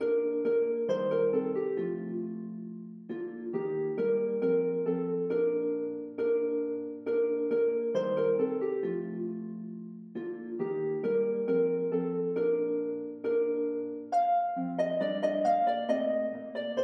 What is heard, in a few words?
Ding Dong Merrily On High Harp Version